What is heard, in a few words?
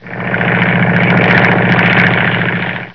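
airplane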